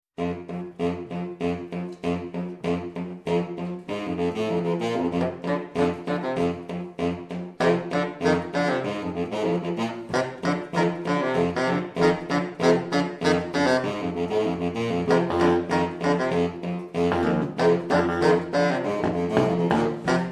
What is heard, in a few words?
SAX MAFIA